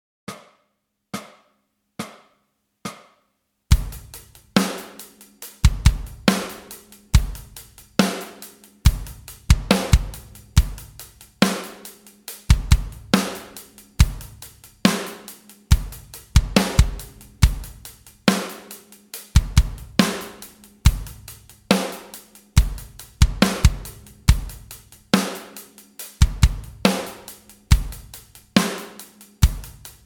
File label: Backing Track